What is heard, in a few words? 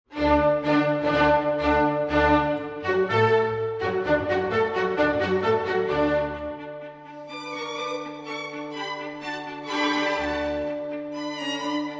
Violin Concerto in D Major, Allegro